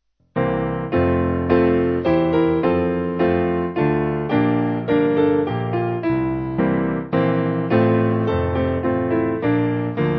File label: The Master Hath, Piano; Ash Grove